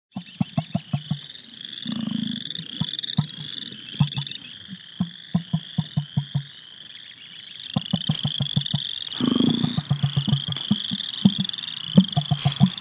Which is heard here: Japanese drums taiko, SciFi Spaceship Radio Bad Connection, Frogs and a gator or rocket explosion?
Frogs and a gator